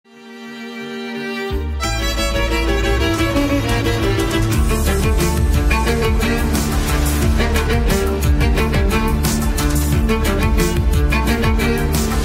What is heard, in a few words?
Quartet